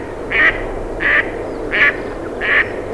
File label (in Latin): Anas platyrhynchos; pticy; utki